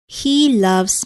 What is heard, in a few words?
he laughs